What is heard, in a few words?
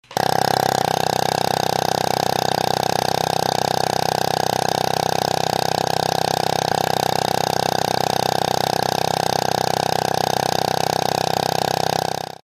vibralert